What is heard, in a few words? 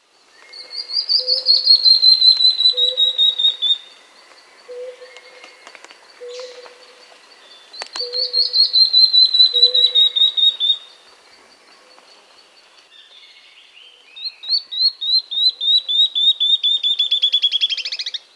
Tawny-Throated Leaftosser (Sclerurus Mexicanus), Andean Birds; S. America